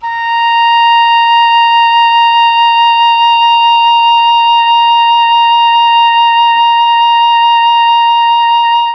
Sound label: A#3 OBOE, Mellotron; CHMBLN OBOE; Mike Pinder - Mellotron Archive CD